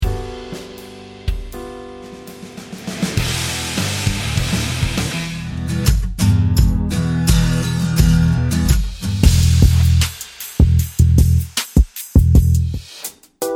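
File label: multiple styles e minor 120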